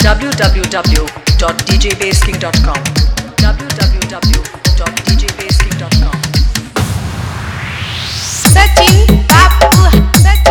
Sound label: Hard Vibration Mix